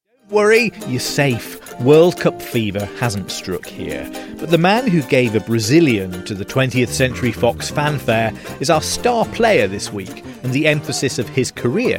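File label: fanfare samba version batucada pagode; rio 2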